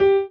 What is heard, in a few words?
marimba; ゆめ2っき